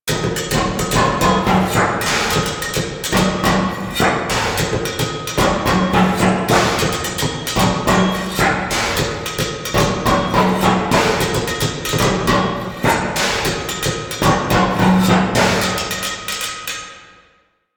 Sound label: Music Exterior, Ambience; Vent Kids Skeg; Warframe; Venus Open World; Venus Solaris United